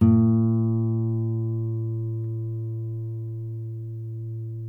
NYLON NO VIB B 2C, Guitars; Spectrasonics; Hans Zimmer Guitars VOL-1

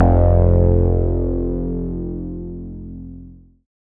Bass, Synths, 5U Modular; Polyend; Simulacrum Sounds; 1 Shots

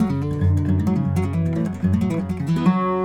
Slippery, Acoustic String; Sonic Foundry (Sony Creative Software); Friendly Fire

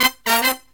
Killer Brass Riffs, 108 soul

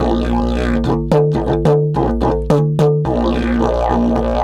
108, DIDGE 108BPM; Big Fish Audio - Didgeridoo and Other Primitive Instruments

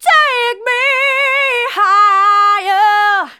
Female vocals and robo-vox

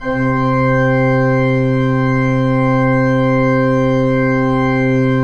PIPE, 3DOrgans

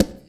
Conga; Equipped Music; Loungin House

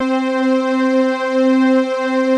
JUNO PADS; Pro-Rec - Synthsonic; JV PADS

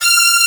Strings G4

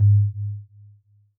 Voyager Perc, Moog Voyager

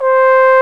BRS TRB, Solo T.Bone; Roland L-CD702

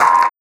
Paper Kick; Sonic Foundry (Sony Creative Software); Esoterik Beatz; Paper Kit

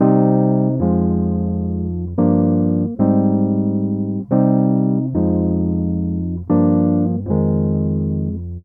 111 C DISCO WURLI; 111 BPM

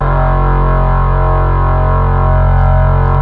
PPG WAVE, OLD SYNTHS

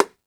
Latin Layers, Drum Machines E-MU Pro-Cussion

